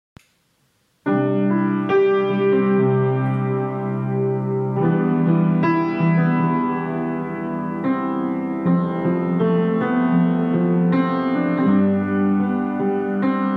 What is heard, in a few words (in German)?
klavieremotionen